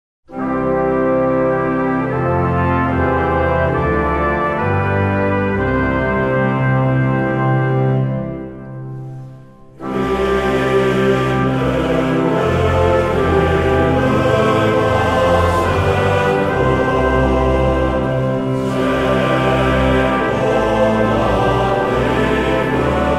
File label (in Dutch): TTBB